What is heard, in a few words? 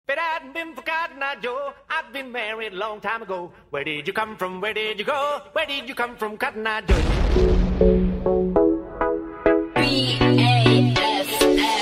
HipHop